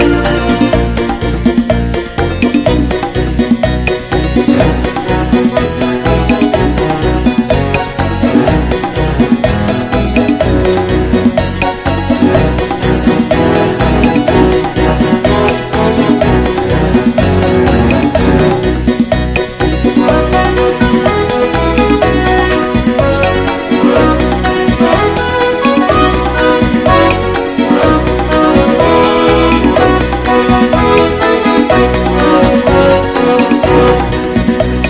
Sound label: Hot Big Band